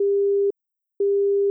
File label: Car Warnings; oviatt